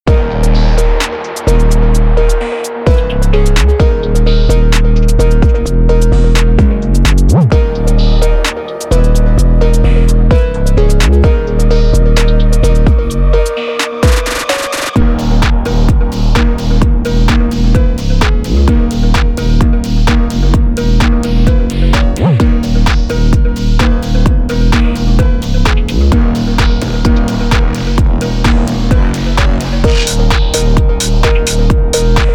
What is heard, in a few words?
gym phonk